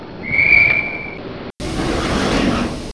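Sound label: Door Close, Train; V43 Halbi Light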